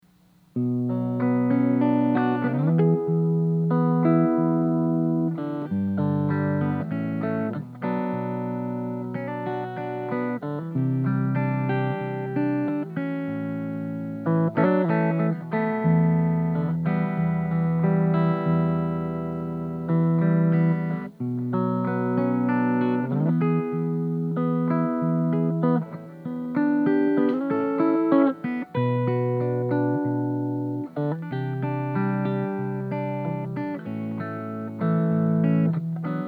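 clean bridge, Clean – Fingerpicked, solid body Les Paul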